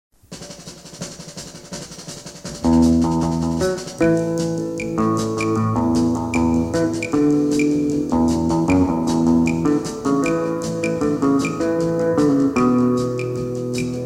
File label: tango, big guitar